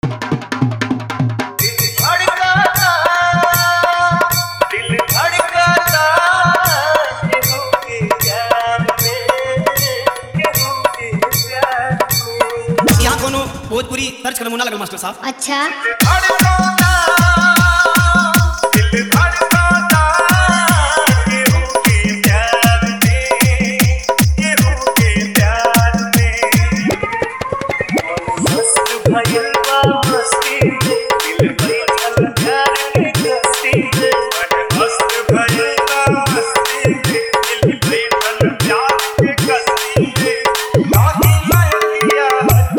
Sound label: Full Hard Dholki Fultu Dance Mix